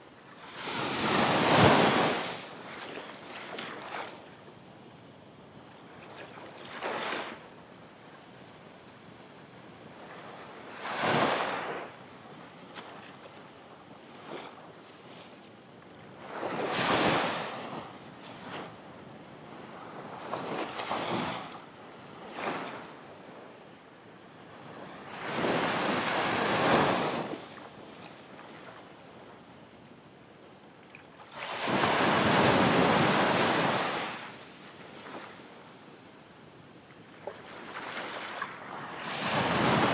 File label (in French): mer plage